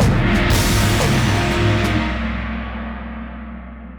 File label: Dramatic Event Bosque